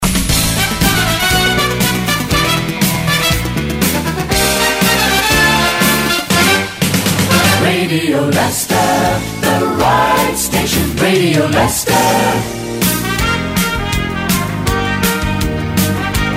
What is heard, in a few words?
jingles; Radio Leicester